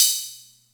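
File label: Atlantex Music Percussion Computer hi-hat closed; Atlantex MPC-1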